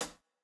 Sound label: Jrock hihat